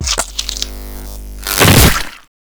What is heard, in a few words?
Infested Ancient Melee Mid Spin Flesh